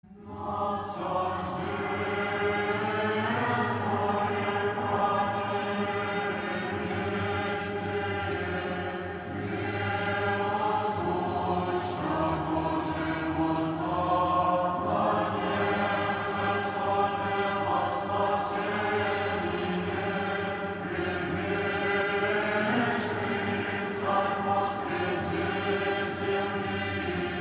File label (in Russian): Фита. Литейные стихеры на Воздвижение Креста